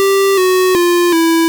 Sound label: gameover, digital; 1.5s